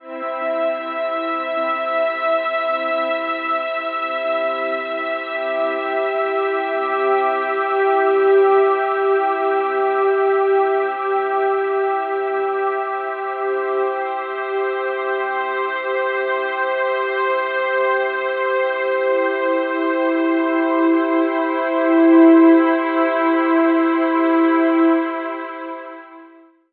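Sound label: awash in C major, analogue, ambiosis; Zero-G Ambiosis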